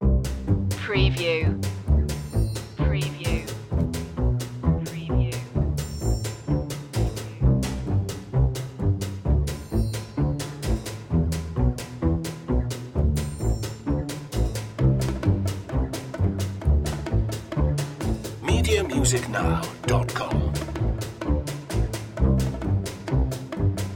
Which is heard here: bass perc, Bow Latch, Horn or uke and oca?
bass perc